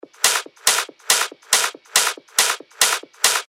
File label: techno loop 140 BPM; Uno; Revealed Techno Top Loops Vol. 8